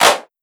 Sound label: Clap Acidic, 808; 808 Claps; Slate; Vintage Drum Machines Volume 2 808 Edition